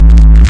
desecrated bass hit, evil bass sounds

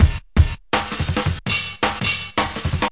164 ruff, drum loop; 161 to 180 bpm

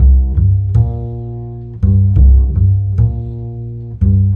110 floaty acoustic bassline, bass loops

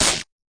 fx drum, Electro FX

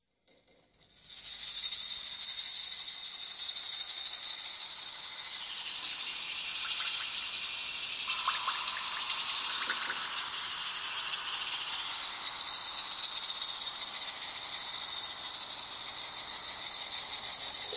ethnic rattle atmospheres; Atmospherics

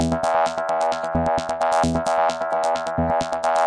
131 sneaky trance; cantons rollertechno; REMIXABLE COLLECTIONS

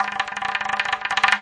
arabic perc, Arabic Percussion Kit

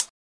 classic hh; Hip Hop; STYLE KITS; Classic Hip Hop Mega Kit

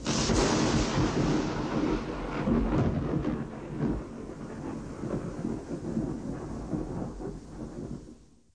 thunderclap; Zoo and Nature

